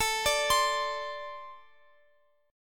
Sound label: A Minor Double Flat 5th, down, Guitar, Open F; Ambb5; arpeggiated